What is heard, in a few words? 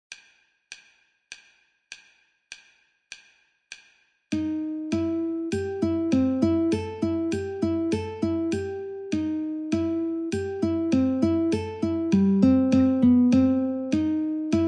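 Chicken Reel C Guitar metronome 50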